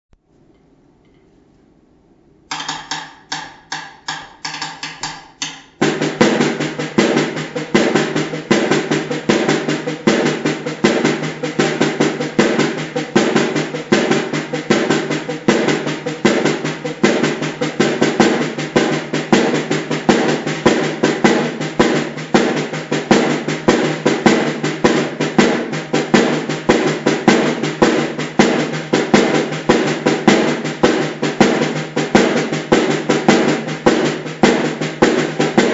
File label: ileaye caixa reggae clave